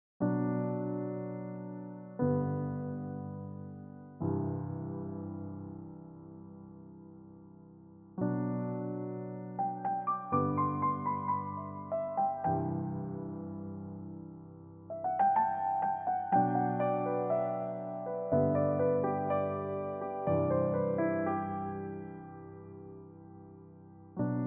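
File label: Prelude G major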